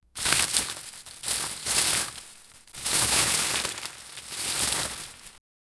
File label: rustling bundles of harve, agriculture